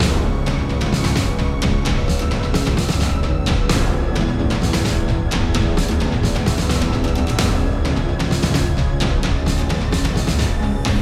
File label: metal version